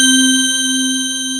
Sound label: DELICATE, NEW AGE